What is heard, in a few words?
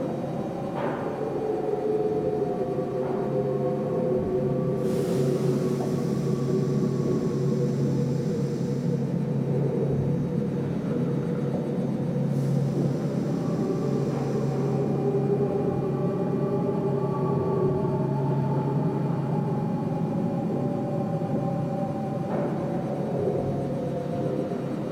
Galleon Generic Amb; Warframe; Ambience; Grineer Galleon